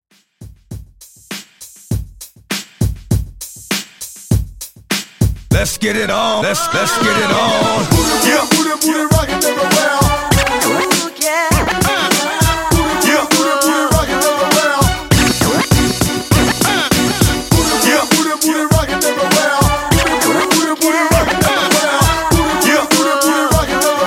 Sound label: Hype Break Redrum 100 Bpm